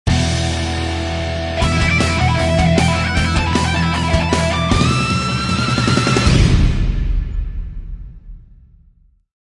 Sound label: Cheese Rock Lift Off, Percussion; Relentless Percussion; Gothic Storm Music